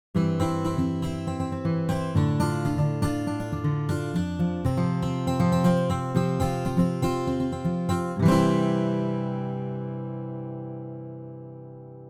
Real Guitar Chord

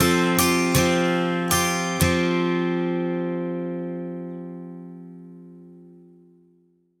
Pick

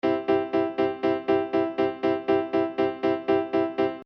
staccato

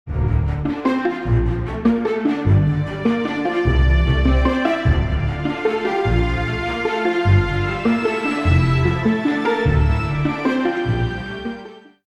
Aug Strings

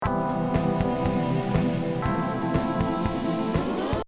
drums

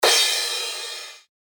reverse

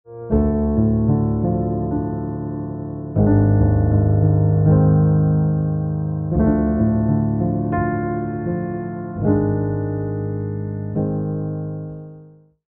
Piano

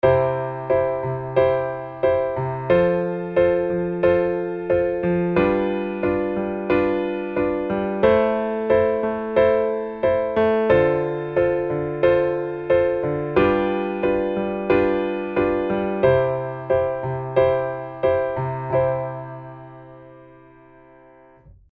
Deceptive Resolution

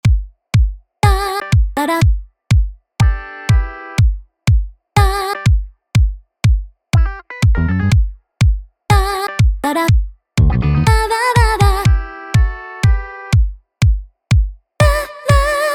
CLAVINET

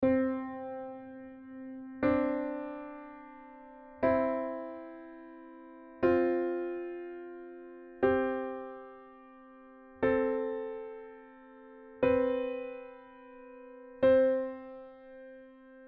interval

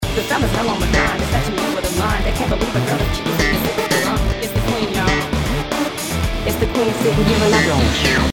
slap bass

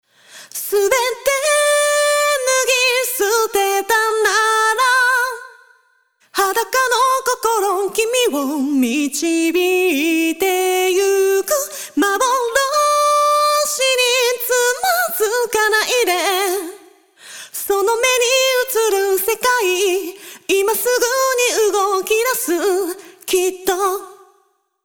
vocal normal comp

